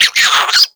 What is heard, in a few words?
Infested Minion Bark, Vocals; Warframe